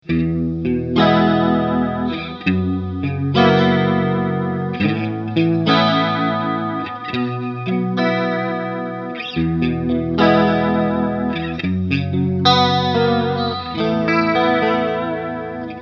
Walrus Audio Julia chorus all noon

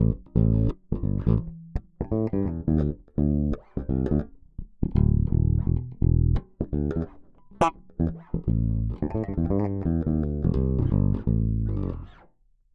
Ibanez EHB1006MS Finger Bridge Passive

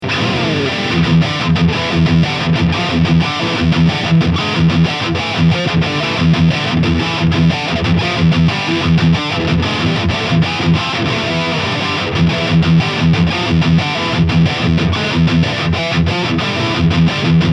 Harley Benton SC 550 II High Gain

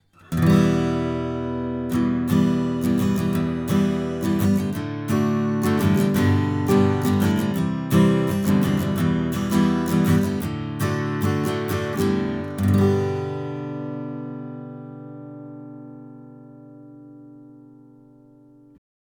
Acoustic Guitar 20cm 15th Fret; DPA 4060

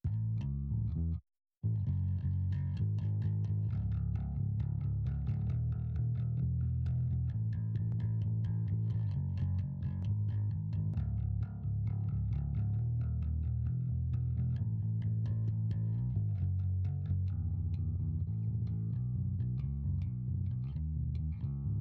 ELEBASS OUT

